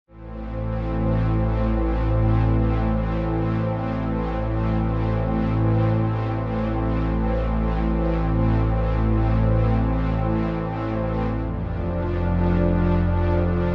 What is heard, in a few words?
Synthy Chords Only